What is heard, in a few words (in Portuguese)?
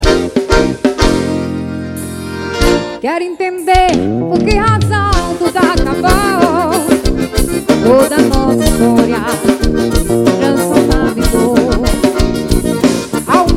forro ao vivo; sitio do lazer